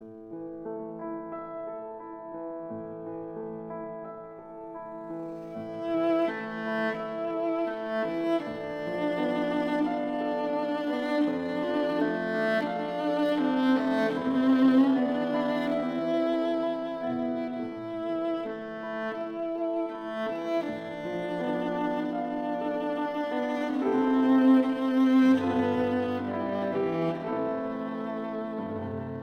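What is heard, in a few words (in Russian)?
ля минор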